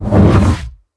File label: whoosh; cstrike; valorant; reaver vandal